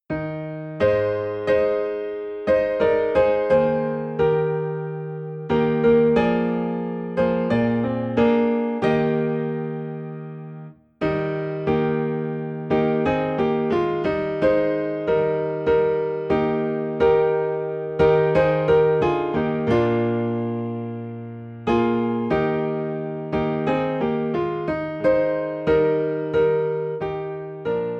Piano in E Flat; I Know Whom I Have Believed

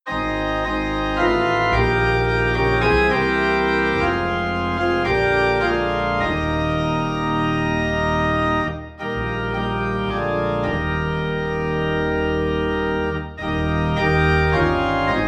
Organ Low in E Flat

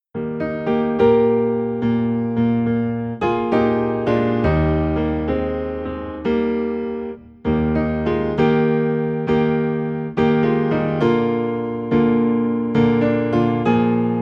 Piano Low in E Flat, hymns; I Will Sing of My Redeemer

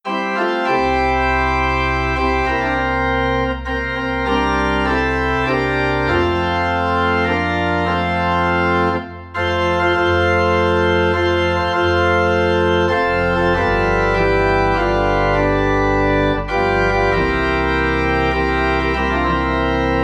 Organ in F

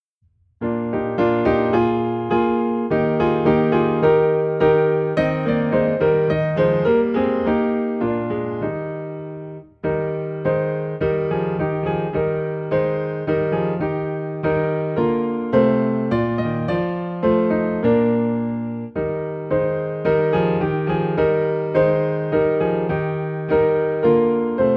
Piano in E Flat; Lord Dismiss Us With Thy Blessing

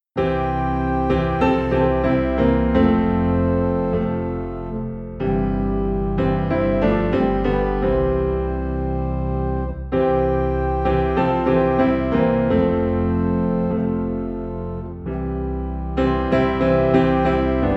Piano and Organ Low in C

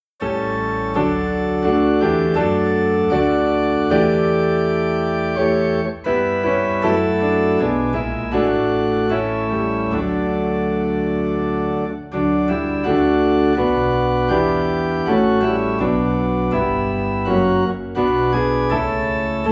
Piano and Organ in D; Thou Didst Leave Thy Throne; christmas

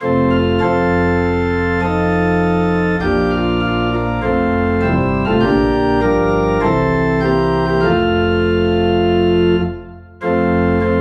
Organ Low in F

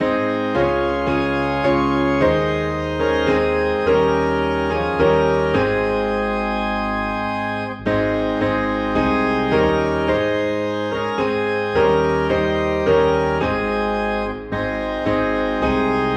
Piano and Organ in A Flat; Organ and piano played together.; Whiter Than Snow

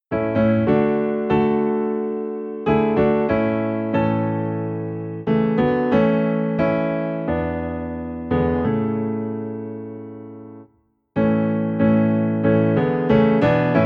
Piano, Low in A; Savior Like a Shepherd Lead Us

